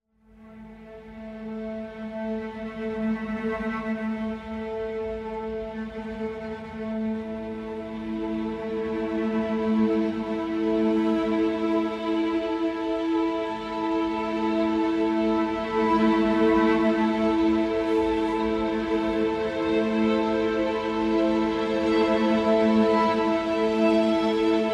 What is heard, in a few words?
AIR COMEDY